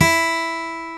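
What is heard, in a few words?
acoustic guitar, country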